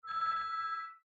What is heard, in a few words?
phone incoming call; windows; aerothemeplasma; stereo windows